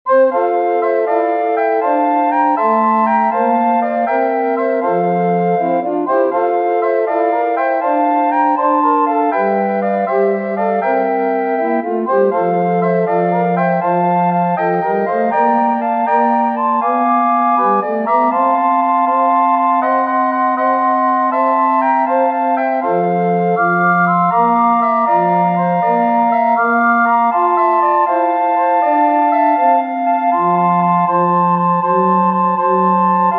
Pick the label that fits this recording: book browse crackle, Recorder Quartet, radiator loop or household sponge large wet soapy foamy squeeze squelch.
Recorder Quartet